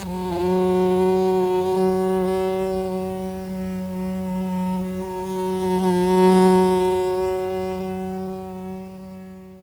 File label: fly; animals; best service Blue Box